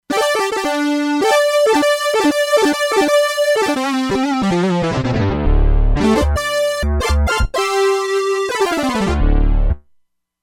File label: Square Link; Factory Presets; E-mu Proteus-2